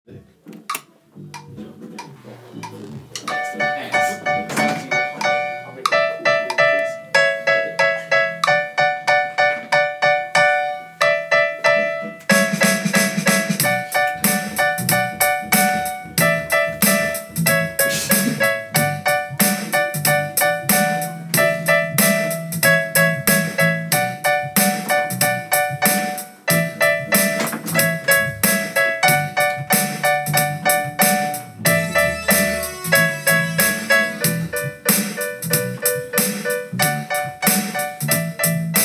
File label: piano